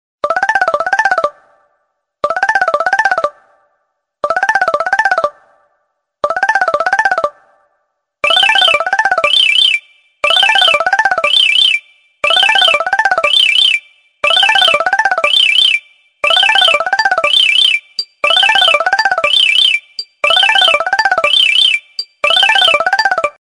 mix funny tone

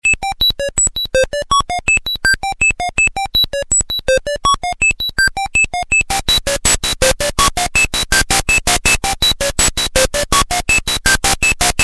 crazy ring alert

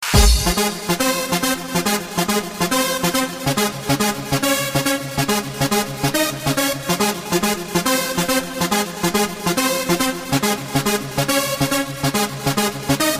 sound of nature beat

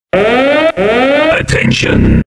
Message Alert

